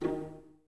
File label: Violas D#3 Pizz; Miroslav Vitous; String Ensembles